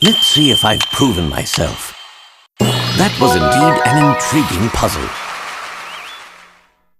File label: lead up win; layton